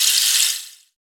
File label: Rainstick, Percussion, Shakers; Roland BK-5